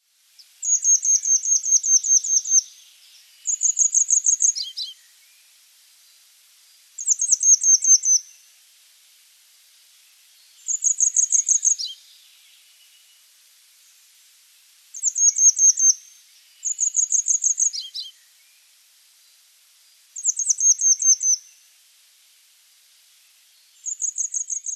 Bergeronnette des ruisseaux, les oiseaux

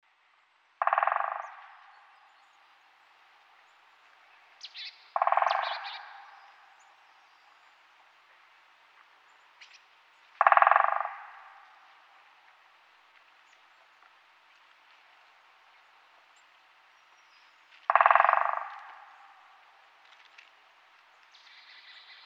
Pic epeiche, oiseaux; audio les oiseaux

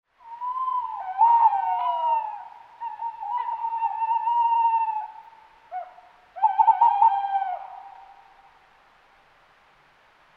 Chouette hulotte, oiseaux; audio les oiseaux

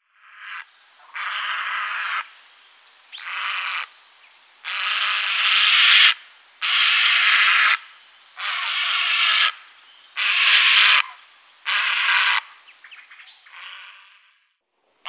Heron cendre, oiseaux; audio les oiseaux

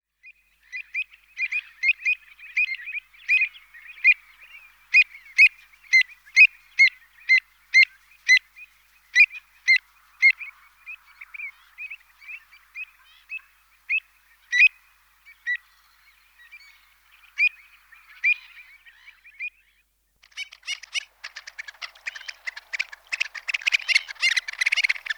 Avocette elegante, les oiseaux

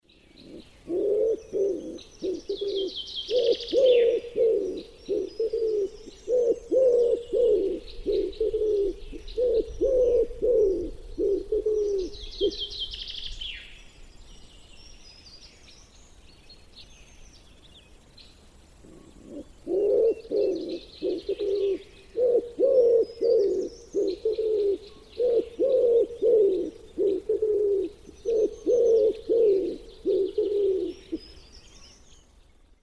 PIGEON RAMIER CHIFF CHAFF, oiseaux; audio les oiseaux

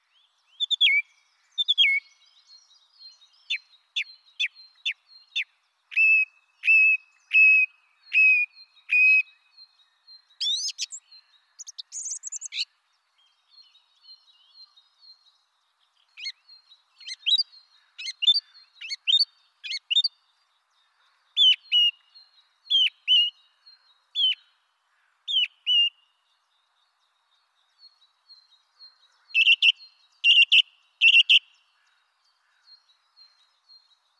Grive musicienne, oiseaux; audio les oiseaux

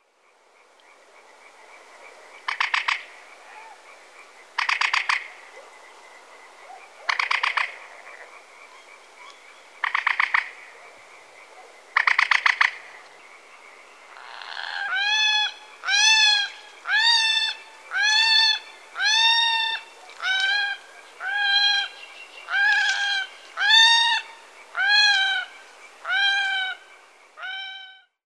Gallinule poule d'eau, oiseaux; audio les oiseaux

